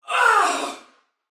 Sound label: Death, Female, Soldier; Dialog; Barks; Battle; Sinecure